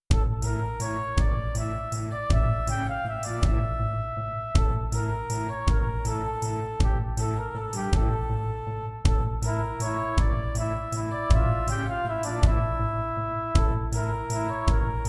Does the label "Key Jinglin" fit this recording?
No